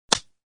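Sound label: slap on the back of the head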